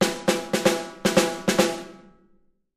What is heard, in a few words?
Drums Snare March